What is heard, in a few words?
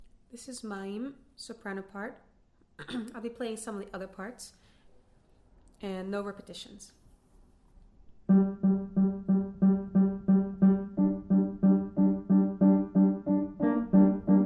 Mayim Soprano